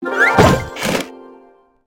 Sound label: open, lootbox